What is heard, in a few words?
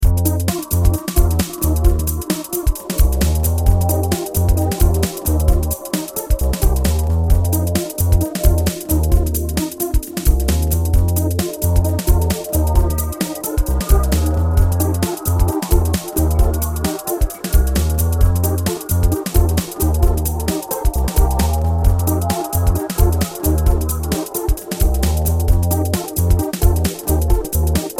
music ingame; CAC ASSETS